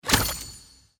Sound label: start